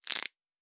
Bone; Survival Game Sounds - Lite Edition; Combat and Hunting

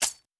Stab; Survival Game Sounds - Lite Edition; Combat and Hunting

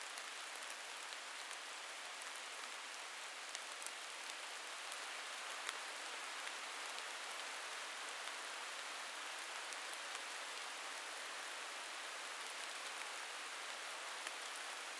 Rain Moderate Loop; Survival Game Sounds - Lite Edition; Environment

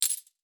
Coins; Survival Game Sounds - Lite Edition; Objects and Items

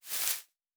Foliage Foley; Survival Game Sounds - Lite Edition; Environment

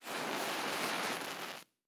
Matches Light; Survival Game Sounds - Lite Edition

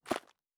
Dirt Walk Surface, Footsteps; Survival Game Sounds - Lite Edition

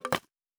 Wood; Survival Game Sounds - Lite Edition; Environment

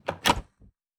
Door Open; Survival Game Sounds - Lite Edition; Doors

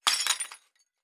Glass Shatter; Survival Game Sounds - Lite Edition

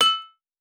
Mining, Crafting; Survival Game Sounds - Lite Edition

